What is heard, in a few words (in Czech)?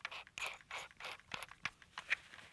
naradi; Mafie 1